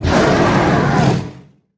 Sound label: Hoarding Dragon Attack